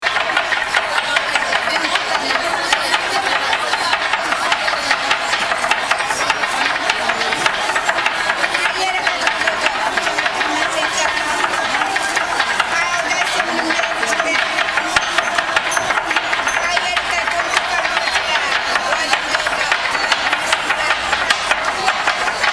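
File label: rain; soundscape; 川崎大師の参道のお店で、アメを作っているときの音です。; 包丁で、つねに、リズムをとっています。; どのお店でも、同じリズムみたいです。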